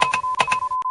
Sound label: intense; matilda